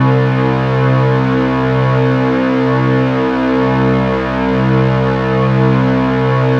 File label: ms20 metallo stringus; MS-20 layers